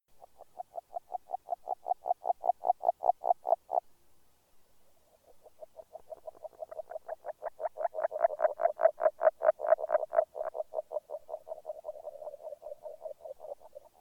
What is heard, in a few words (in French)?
grenouille agile